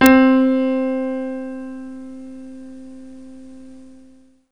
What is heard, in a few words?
ACPNO, Proteus; Drums